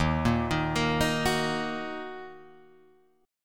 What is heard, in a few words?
D sharp Minor D sharp, Guitar Open G; chordshape